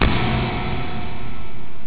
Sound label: perc; pop